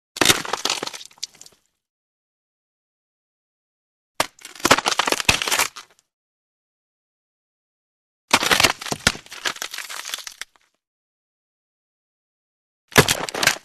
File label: Bone Cracks Bone Crack & Splinter With Blood Squirts; Hollywood Edge Foley Sound Library